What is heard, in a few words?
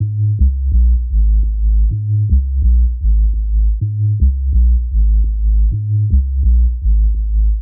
Bass Loop 126 Ab